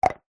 measuring cup small plastic set down wood surface; household; zapsplat

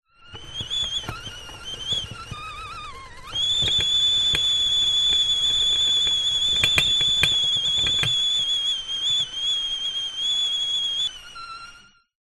household kettle boil whistle old fashioned

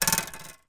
cartoon bounce diving board; zapsplat

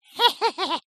cartoon witches cackle laugh

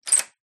household door lock turn with key

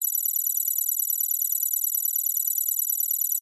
science fiction computer processing loop

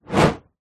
nature fire flame torch whoosh air past

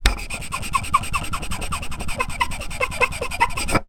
foley wood pole turn rub scrape multiple times; zapsplat

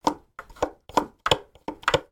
musical synthesizer modulation wheel movements

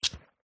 Backpack, Handling, Buckle, Open; PMSFX

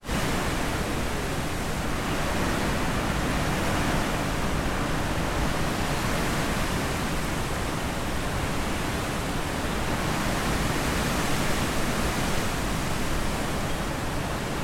wind strong stormy gusts trees birds

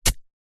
foley wood post sharp pointed dig into soil

